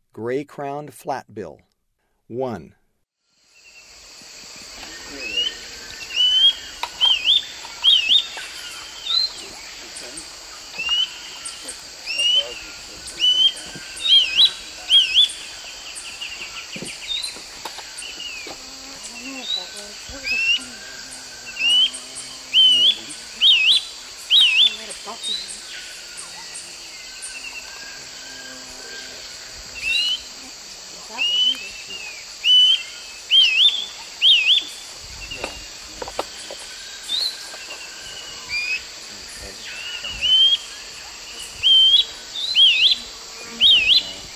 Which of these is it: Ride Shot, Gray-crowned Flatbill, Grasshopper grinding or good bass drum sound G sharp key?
Gray-crowned Flatbill